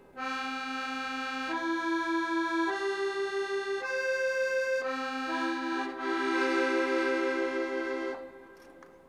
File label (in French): accordeon 2 voix